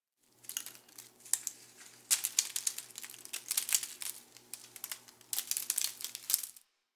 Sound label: Derelict Leave Drop, Ambience, Interior; Warframe; Orokin Derelict